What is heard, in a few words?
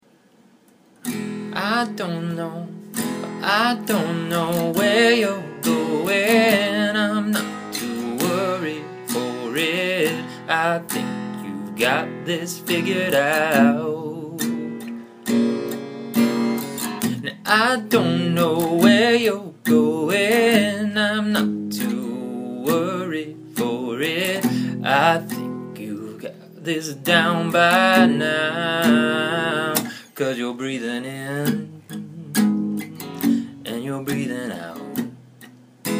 Breathing In out